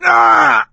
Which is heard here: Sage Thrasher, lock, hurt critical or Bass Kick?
hurt critical